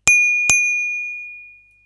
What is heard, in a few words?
bell; 卒業研究発表で使うタイマー